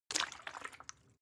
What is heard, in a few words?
bottle water upend slow; berufe